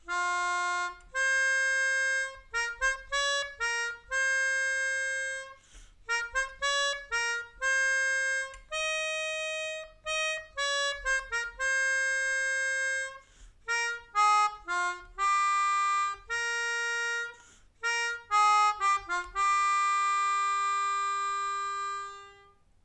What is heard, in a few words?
Melodica